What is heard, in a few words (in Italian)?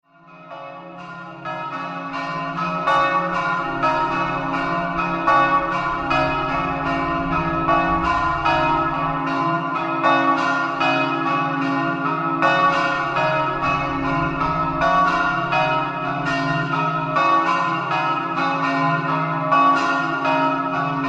campane; FLAIBANO